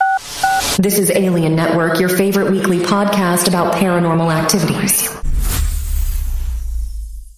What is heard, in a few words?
podcast jingle